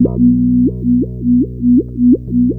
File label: FILTER BAS, Electric Basses; PlatinumPhattCD2; E-MU Producer Series Vol. 8 – Platinum Phatt